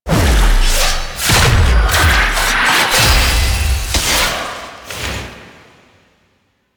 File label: Emote Skin Swap; Warframe; Nineteen Ninety Nine; Arthur